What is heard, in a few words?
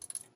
Light Flicker; The Joy of Creation Halloween